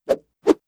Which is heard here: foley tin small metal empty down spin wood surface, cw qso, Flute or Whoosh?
Whoosh